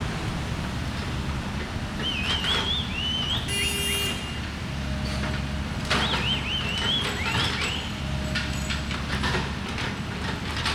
Downtown Houston Criminal Justice Center Construction Whistle